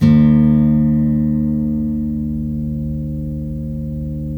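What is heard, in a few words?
12-string guitar; GUILD 12-STR; Spectrasonics; Hans Zimmer Guitars VOL-1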